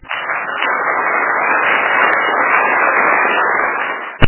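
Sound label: applause of a large group of people with echo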